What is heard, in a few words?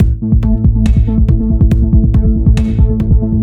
Beat